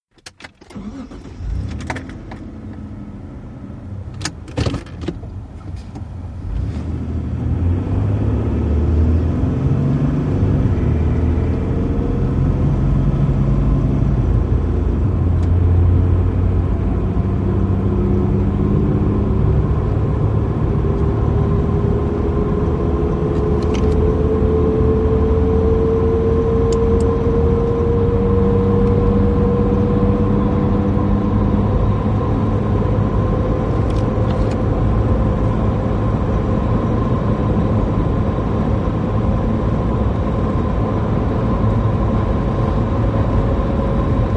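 Mitsubishi Montero interior: Onboard, start, constant run on main road, turn indicator, stop, switch off; VEHICLES MITSUBISHI MONTERO: INT: Onboard, start, constant run on main road, turn indicator, stop, switch off.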